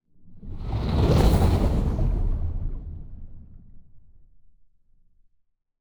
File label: titan move; aquatics